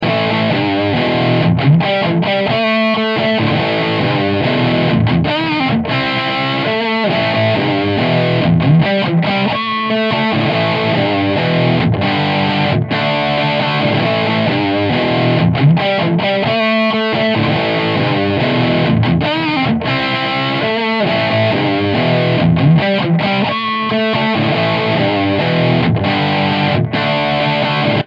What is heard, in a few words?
Soldano M160 Cone Edge